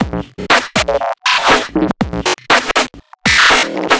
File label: Filthy Percussion Loop; soundbits